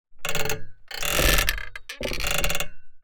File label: Metallic swing stress and pressure texture